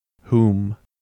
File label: Hum; Navi Words no English